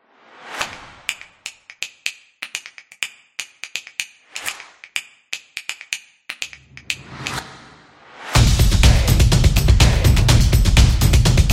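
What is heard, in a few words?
Energy, rock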